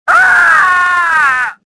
death; Star Wars Jedi Knight II Jedi Outcast; XBOX

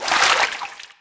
fountain splash; Tony Hawk's Project 8; XBOX; houses

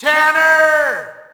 Shout, audience; UFC Tapout 2

